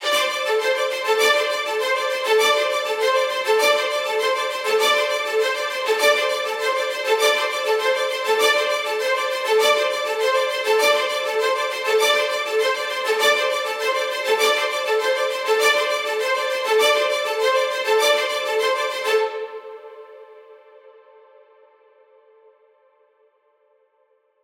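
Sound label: Violins Ostinato - A minor 100BPM; Construction Kits; STEMS; Ghosthack; SkyRealm; Kit 10 - Windhelm Forest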